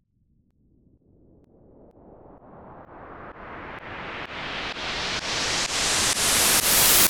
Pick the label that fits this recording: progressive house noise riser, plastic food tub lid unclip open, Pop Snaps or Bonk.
progressive house noise riser